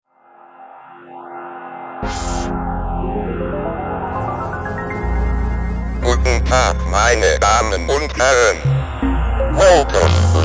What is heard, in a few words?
Synth; Synth-Werk